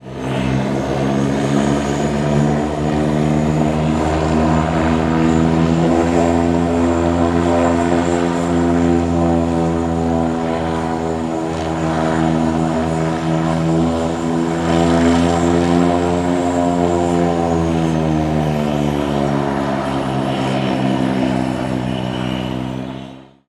biplane flying; transportation

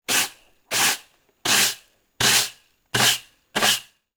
broom sweeps

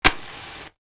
matches; nature

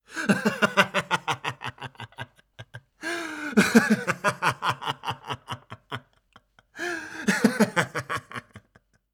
man laughing; human

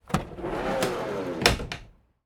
van side door slide open exterior perspective; transportation

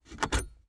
car cigarette lighter out; transportation

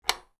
switch

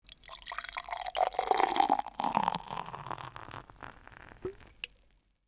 pouring beer; drinks

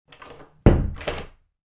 door close

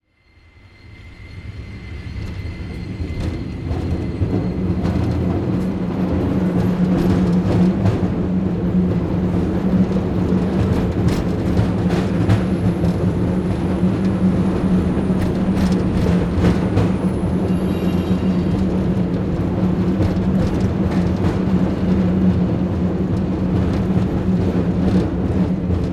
cable car ride; transportation

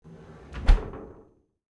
fridge close, kitchen

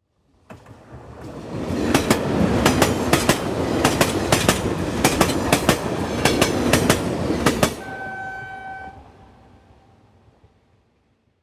train pass by; transportation

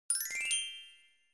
magic chime

